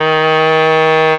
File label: sax; Winds